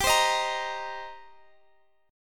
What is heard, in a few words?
D sharp 6th, G